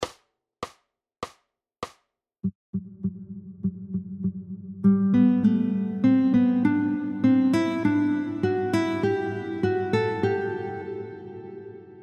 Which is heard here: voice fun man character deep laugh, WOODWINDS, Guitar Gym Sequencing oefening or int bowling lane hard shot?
Guitar Gym Sequencing oefening